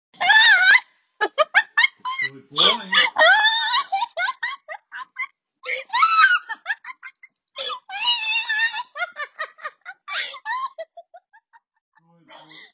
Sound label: Giggle